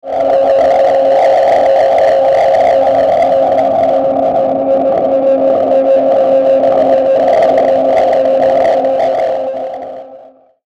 Feedback Bright Distortion Swell, Feedback Crystal Eerie Tremolo Swell; Feedback Sound Effects